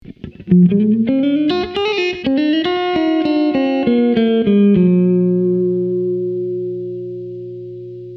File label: ac30 clean with echo enhanced